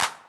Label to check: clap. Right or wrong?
Right